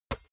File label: basketball single hand catch gentle